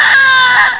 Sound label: ARGH; ExChaos